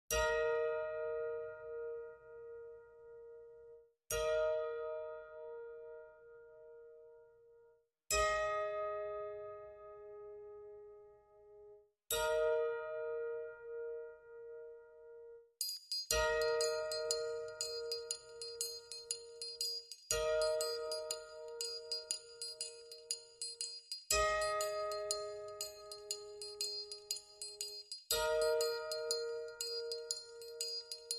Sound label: Light Background with Soft Percussion